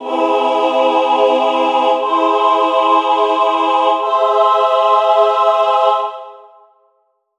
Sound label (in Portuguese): aumentada 3 inversoes; musicologia